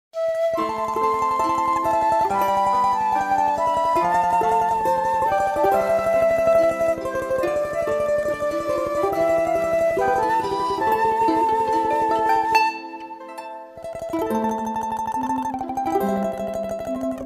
gimn buriatii

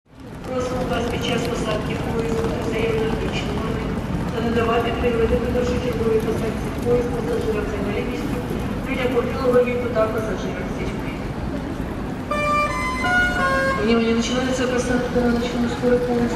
jd vokzal odessa

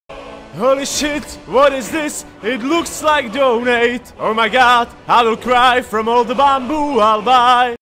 muzyka dlya donata

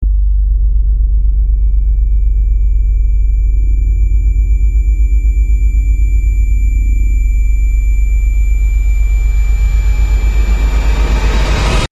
effekt zamedleniya vremeni dlya montaja

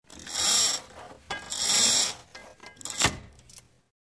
zvuk zhaliuzi

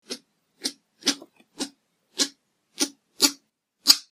norka

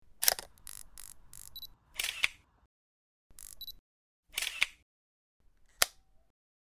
fotoapparat

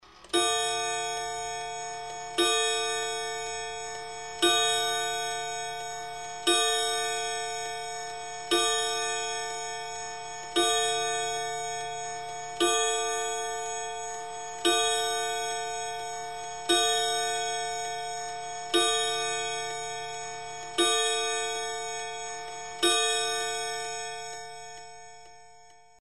zvuk nastennyh chasov but vremya; Разные звуки секунд и секундной стрелки, тиканье настенных маятниковых часов с кукушкой

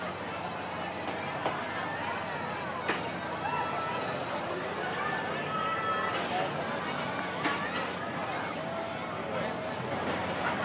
zvuk karnavala

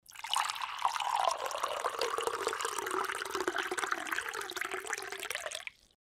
nakivan chaia v chashku

